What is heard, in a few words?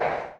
foot crow; phoenix-end